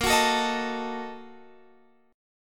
Bb Major 7th Suspended 4th Sharp 5th, Guitar, Open F; strummed